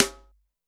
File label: snare, Zoom MRT-3B